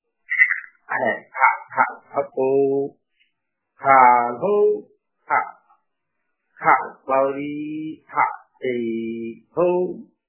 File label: Gir Cow Milking Sound; increase cow milk naturally music